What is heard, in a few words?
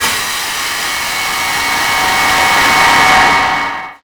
Transition Noise Static; Production Element; Title Transition; AdobeCC